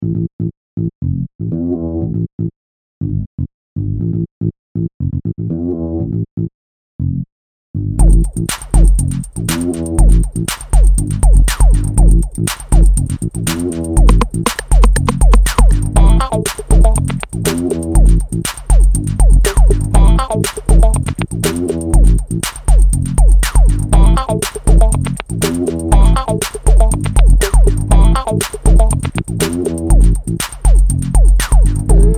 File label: Computer Music